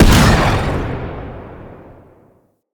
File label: Missile Barrage Explosion; Enemies; Weapons; Corpus; Arachnoid Camper